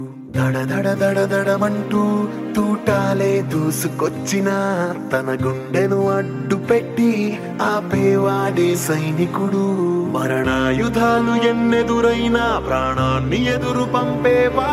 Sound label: Telugu Movie Ringtone; Sarileru Neekevvaru